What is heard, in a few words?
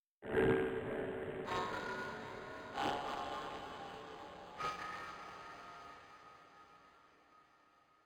Music Hub Layer erosion disto; Music Hub Layer erosion disto 02